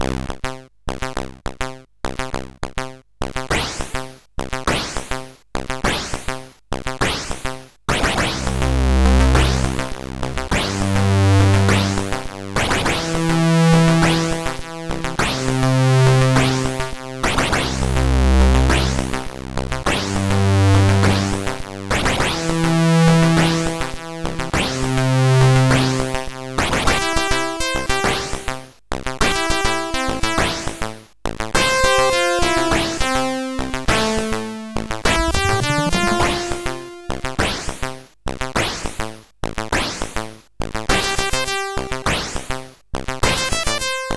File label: Super Synth Sample II CSG8580R5